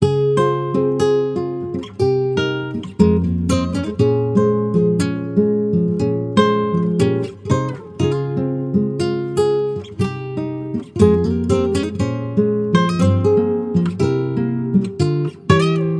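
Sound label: 120 acoustic guitar loop table F minor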